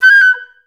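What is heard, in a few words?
flutes F#5; Best Service; Peter Siedlaczek's Advanced Orchestra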